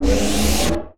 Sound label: activate